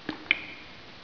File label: CAVE